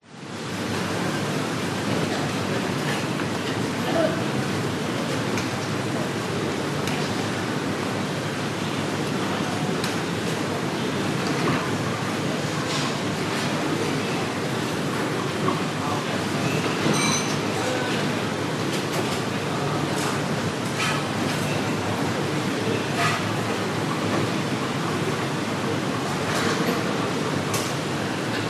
restaurant food court ambience med busy people cutlery hawker centre singapore